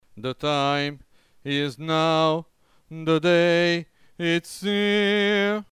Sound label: BAS